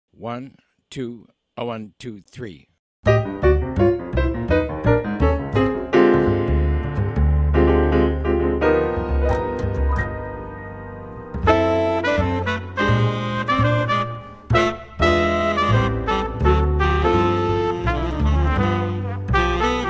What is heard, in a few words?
minus drums